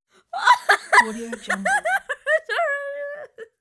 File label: young teenager laughing